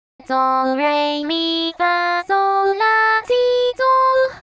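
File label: magenta solfege English; KITA; 高音キタ; cute + natural + light; Kita's original lively tone.; pop, dance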